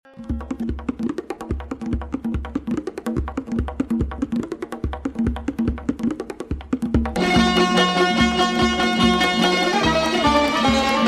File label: Ghateye Orchestre